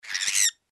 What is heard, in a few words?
cockatiel